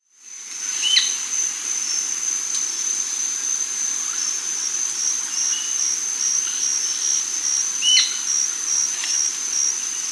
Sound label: Amazonian Royal-Flycatcher (Onychorhynchus coronatus), Peru; S. America